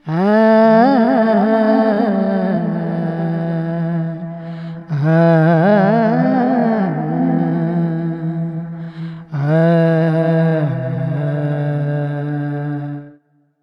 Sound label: Vocals Male, World Pop